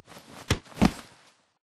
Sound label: spectre blind fire grunt; Titanfall 2